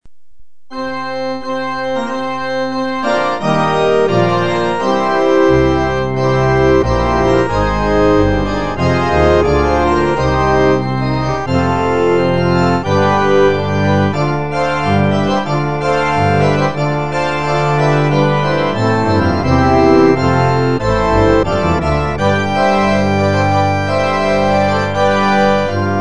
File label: Fanfare and March for a Bride